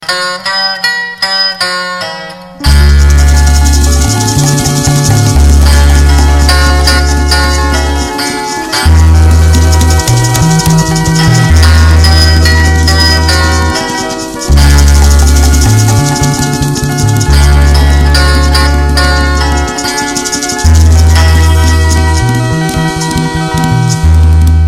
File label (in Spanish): ritmo latino